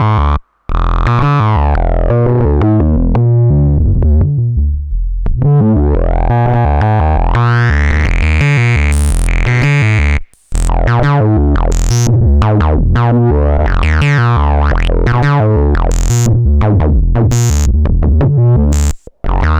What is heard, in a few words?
Liquid Foam Loop; HerbsAndStones